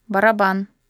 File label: drum